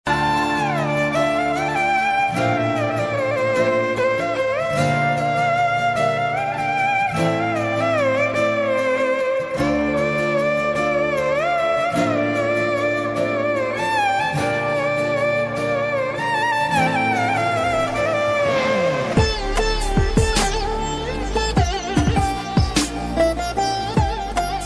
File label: sad bgm; miles of love; telugu